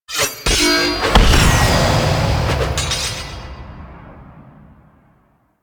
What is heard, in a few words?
Sentient Stalker Sword Slam; Warframe